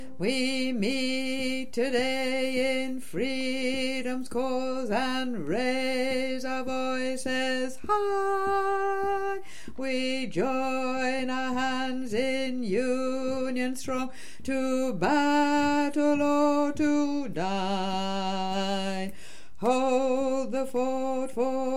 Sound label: Bass